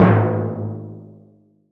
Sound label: TIMP C2, TIMPANI; AKAI S6000 CD-ROM - Volume 5